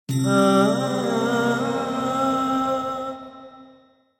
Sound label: start; Enchanted Cleopatra